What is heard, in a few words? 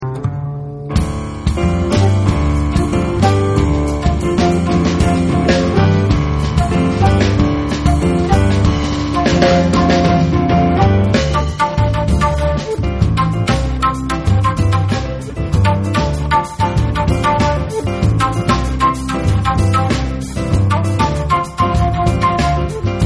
Berimbau